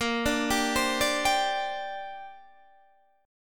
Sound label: A sharp 6th Add 9th, Guitar DADGAD